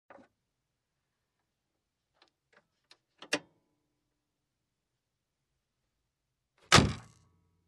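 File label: Opening and Closing trunk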